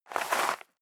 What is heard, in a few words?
step leather shoes gravel light slide short; schritte